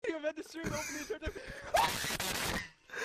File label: yelling